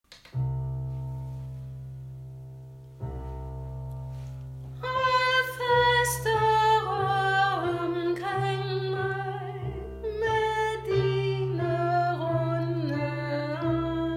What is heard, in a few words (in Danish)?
aftenstunden angst sopran